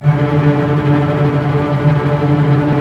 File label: STR, Roland LA Composer